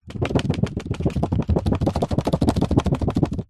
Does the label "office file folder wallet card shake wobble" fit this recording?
Yes